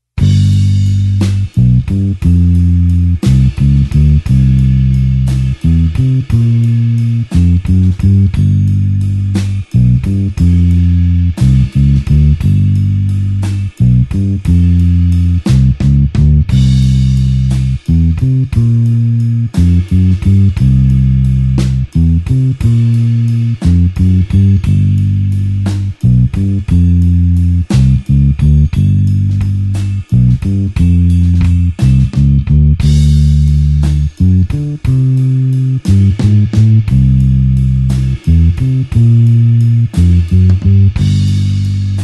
blues bassline slow G

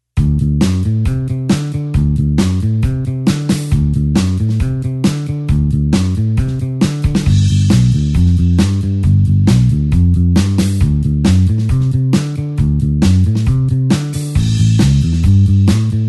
blues bassline 8beat D

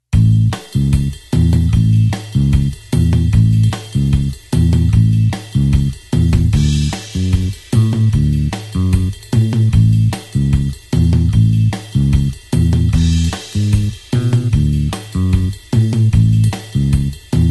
blues bassline 8beat A tempo

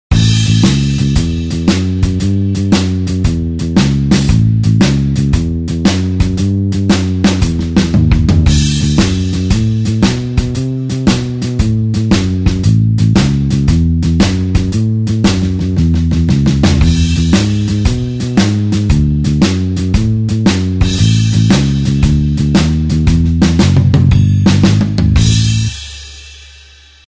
blues shuffle

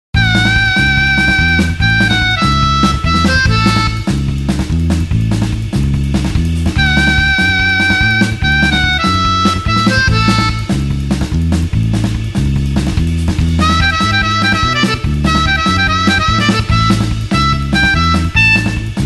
blues harp 8beat A

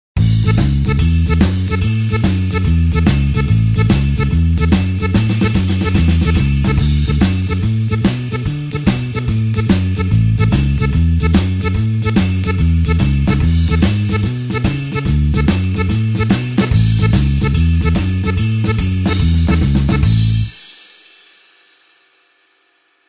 blues harp shuffle A

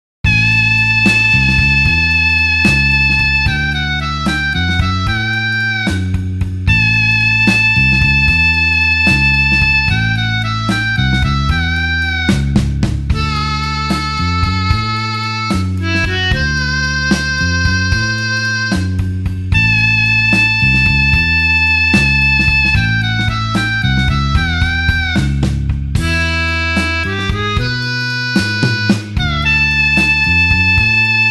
blues harp slow A tempo

